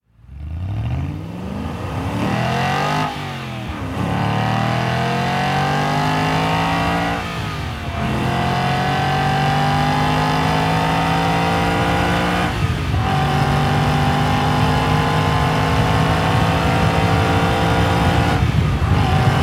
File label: Aston Martin V8 Vantage S; cars